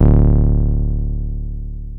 303 C2, TB 303; Hollywood Edge; Giorgio Moroder Rare Synthesizer Collection

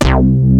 SPLIT; Rubber Chicken Software Sample Bank

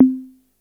tom, Yamaha RY-8

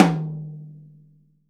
P.HTOM F, VELOCITY TOM; VELOCITY TOM 2

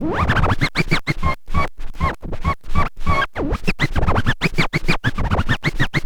scratch med, Vinyl Attack scratches mix

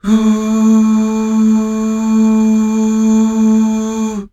UUH

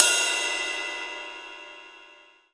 RIDE, MONO RIDE CYMBAL; AKAI S6000 CD-ROM - Volume 3

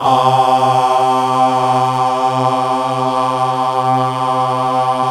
GOSP. AAAHH; Sound & Vision - Gigapack II CD 1

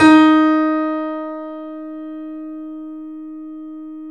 3D Pianos Yamaha C7; E-MU Producer Series Vol. 5

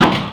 NAILGUN; MACHINES

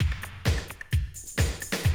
130, Classic DrumLoops

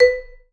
Vibes, B3; Sampleheads - Dave Samuels Marimba & Vibes

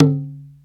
bata; AKAI S-Series CD-ROM Sound Library VOL-5

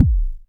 BD, Bassdrums, Roland TD-20

